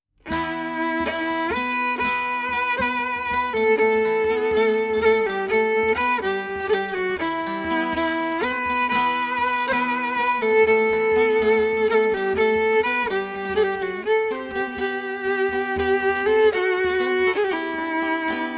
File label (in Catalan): duo saz violí